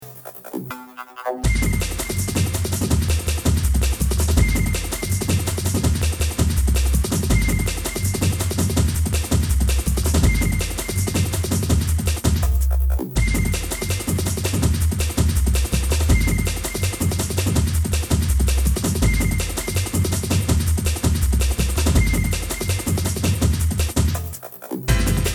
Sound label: Drums Of Justice